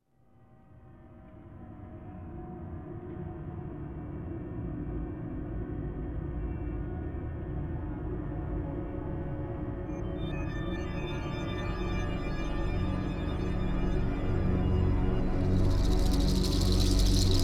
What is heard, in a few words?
Amb Outdoor